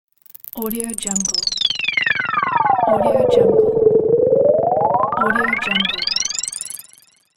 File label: cosmic magic